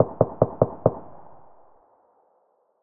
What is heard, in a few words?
ak74 tap faster; weapons; SPT Battle Ambience